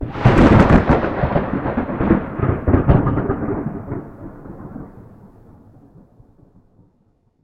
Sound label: thunder